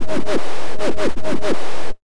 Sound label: BIG NOIS, Big Beat Drumloops; USB Soundscan vol.25; AKAI